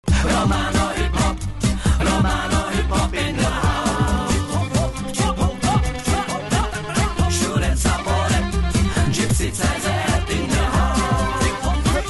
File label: Gipsy CZ Romano Hip Hop; zvuky mobil